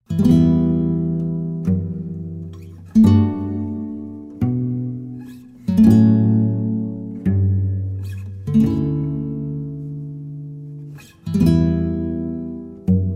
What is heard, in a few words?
Peaceful Guitar; Sweden